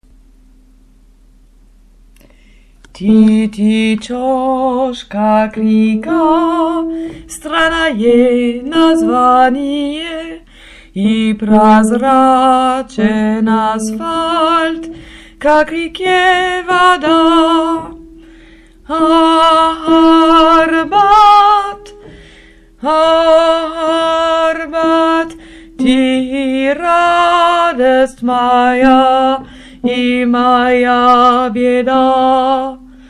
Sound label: alto